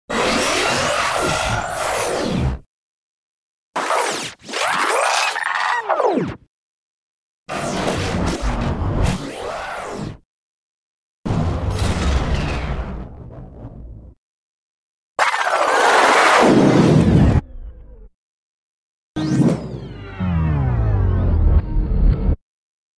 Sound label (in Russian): zvuki peremotki magnitnoj lenty